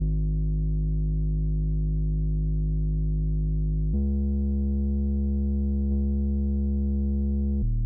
Bass, G#maj 122BPM; 99Sounds; Monosounds; PANDORA BOX!; Beach Season

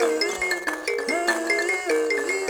PLK Buzz Kalimba Phr; Roland LCDP11 Africa VOL-1

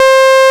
MONO POLY

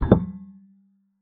pedal, special fx; Xsample VOL-1; 90 s Sample CDs

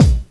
KIT, Dance Mega Drums, HIP; Best Service

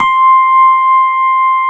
suitcase; Keyboards Old School; USB Soundscan vol.09; AKAI

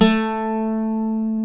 A2, Greek Bouzouki; NorthStar - Global Instruments VOL-1

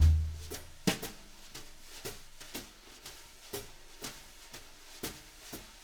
BRUSH LOOPS, Living Drums

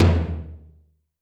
tom, Zoom MRT-3B

